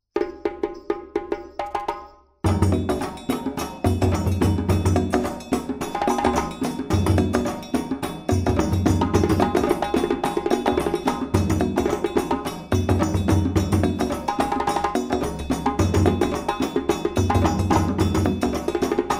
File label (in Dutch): Toro Volledig, djembe